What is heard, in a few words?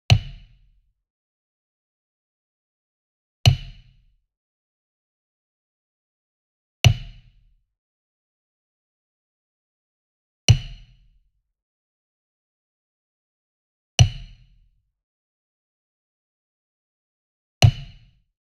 Wood Percussion Wood Block Traditional Resonant Hit Near; boom library; Cinematic Strikes Construction Kit